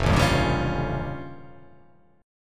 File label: F Augmented 9th F, chord